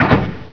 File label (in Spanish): boton